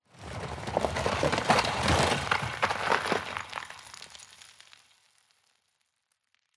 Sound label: tenement rockfall; ambient, rocks